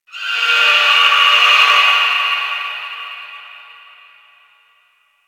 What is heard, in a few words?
Infested Distant Creature Cry, Ambience; Warframe; Corpus Infested